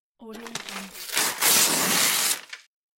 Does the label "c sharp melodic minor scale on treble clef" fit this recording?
No